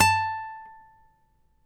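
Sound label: nylon, FLAMENCO, Guitars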